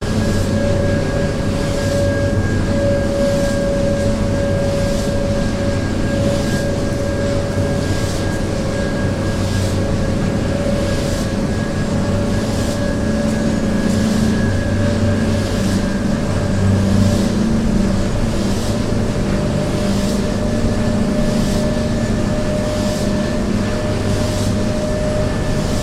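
vetrogenerator zvuk ventilyacii, wind turbine ventilation sound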